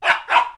dog voice; anemoi

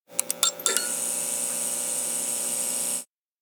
lamp on; anemoi

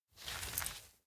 jet fetch collar offer; anemoi パッケージ版 1.1

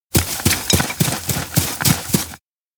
kurumi dig scoop; anemoi

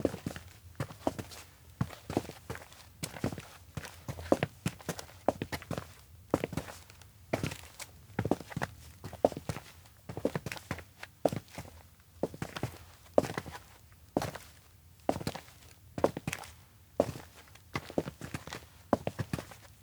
steps outdoor observatory walk; LOOP; awayuki; anemoi

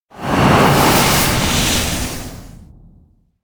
wind rage; anemoi

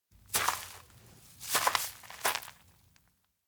steps outdoor ranch patrol kids; anemoi

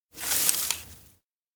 stick pick; anemoi

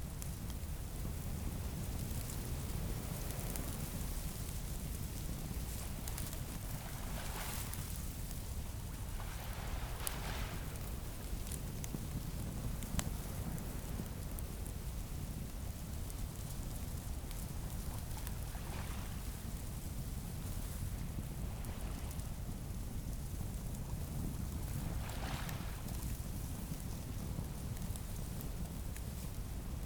outdoor beach campfire; anemoi

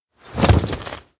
fall; minato; anemoi

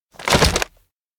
umbrella break; anemoi

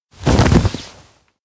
hold huddle; anemoi

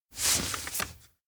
photo takeout clearfile; anemoi

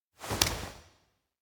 grab hand; aino

